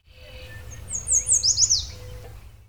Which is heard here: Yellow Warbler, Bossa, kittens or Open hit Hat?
Yellow Warbler